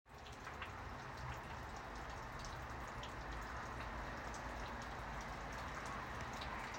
rain

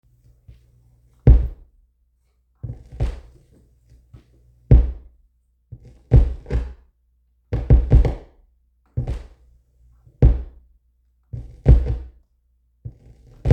Record thump

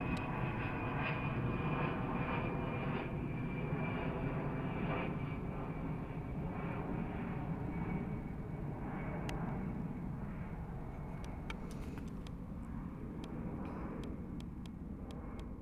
jet

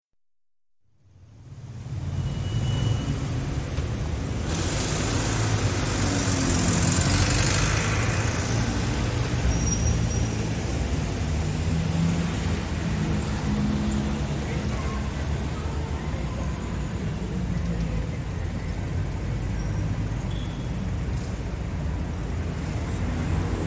Urban Traffic Ambience - Real City Street Sounds

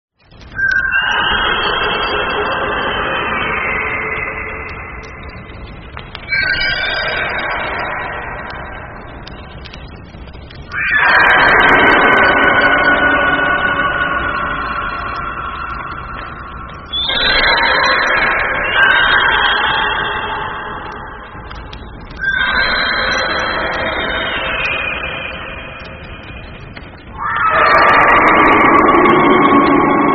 Phoenix sounds

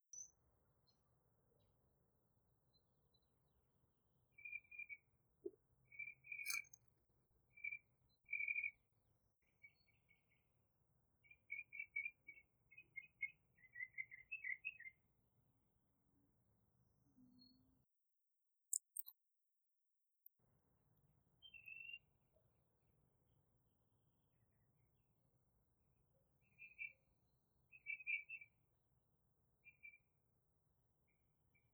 Birds; Removed Background Noise

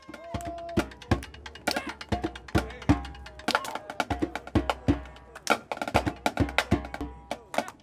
chanting

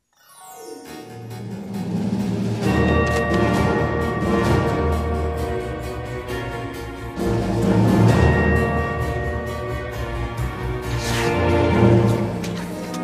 Instrumental

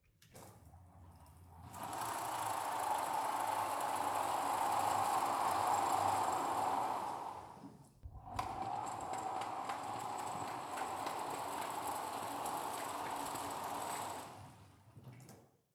Curtain Open

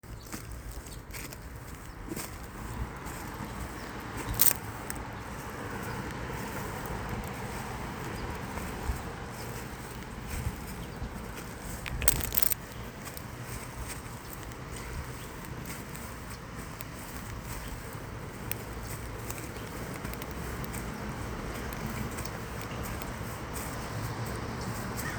Walking through leaves

